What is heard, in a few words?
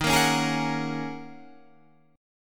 Gb 6th Add 9th Eb, guitar